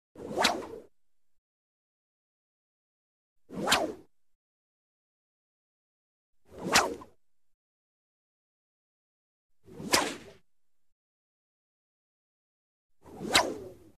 Whip Whooshes Circular Single Circular Whip Swish; Hollywood Edge Foley Sound Library

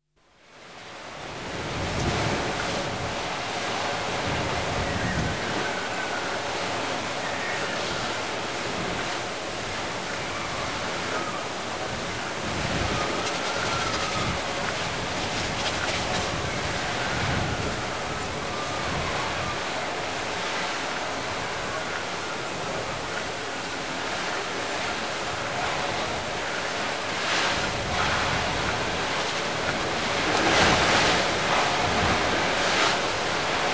Blinding Snowstorm; Dr. Sound Effects; 210 Nature Sounds - 20 Hours of Relaxing Natural Ambiences for Meditation and Sleep

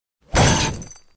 Crash Swish Impact With Metal & Glass; Crashes Impacts; Special Mix

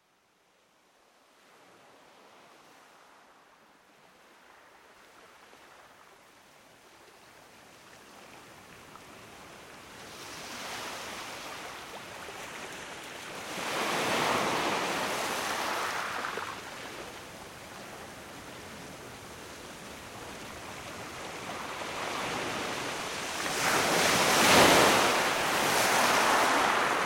Ocean waves

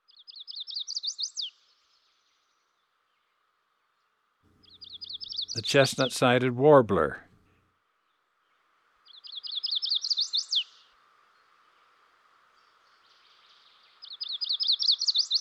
Chestnut-Sided Warbler; Eastern North America; Dan Gibson; Wildlife Identification By Sound

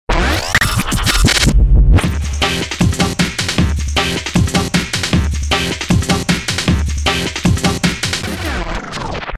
Retro Launch Groove; DJ sounds; Mtrax

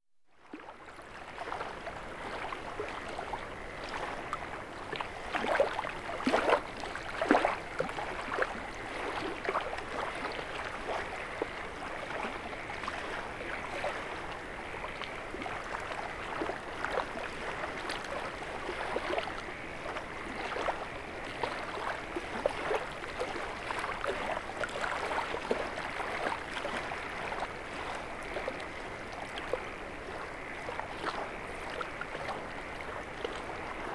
Wading In a Shallow Pond; Dr. Sound Effects; 210 Nature Sounds - 20 Hours of Relaxing Natural Ambiences for Meditation and Sleep

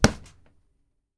SLAP C, FIGHT; 1000fx

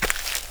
grass, footsteps; 1000fx

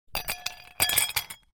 Ice Clinks in Glass Without Liquid; Household

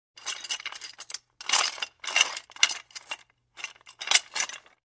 Shackles Metal Rattle; Hollywood Edge Foley Sound Library

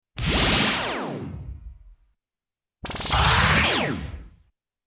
POWERSHOT

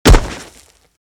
Hard Body Hit; DJ sounds

